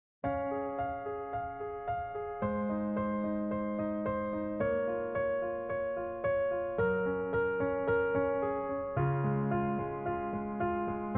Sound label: Instrumental